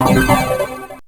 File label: Coin; Raiden Fighters Jet